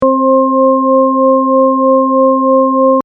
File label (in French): 528 sin